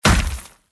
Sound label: Hit